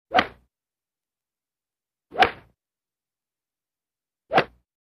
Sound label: Three Golf Swings Imp